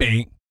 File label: BASS FX, Vocal Planet, Jazz FX; Spectrasonics